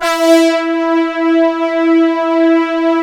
BLOWER E3; Best Service Alpha Dance vol.2; ATTACK

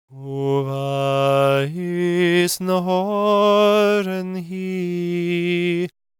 GAELIC, World Voices; ILIO; Vocal Planet

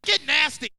Vocal; ZERO G - Datafile DISC 1

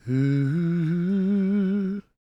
GOSP MALE, SOLO

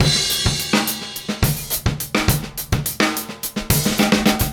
Straight Kit, World Pop Beats; Sonic Foundry (Sony Creative Software)

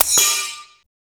CAT CRH, Industrial; USB Soundscan vol.24; AKAI

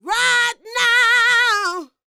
D BIT, Vocal R&B Dance

